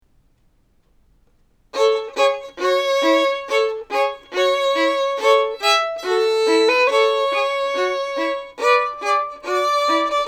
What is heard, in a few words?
Jingle Bells Duet